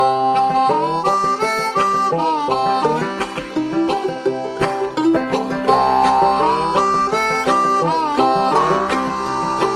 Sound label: house building theme